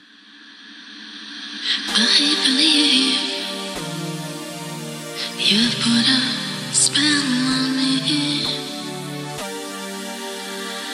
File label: Dancefloor Groove